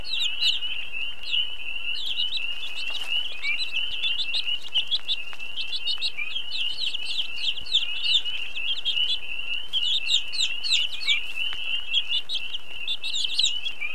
vogel

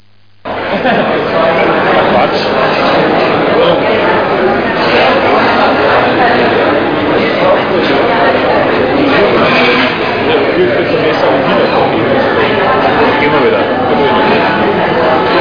kneipe

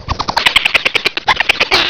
KICHER

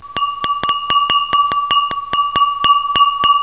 klirr

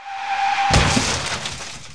unfall

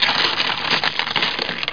blaetter

